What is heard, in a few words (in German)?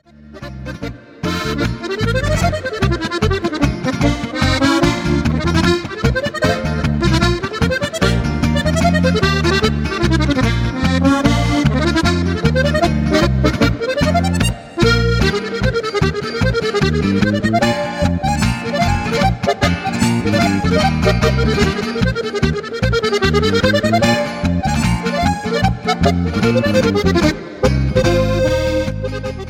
harmonika zauber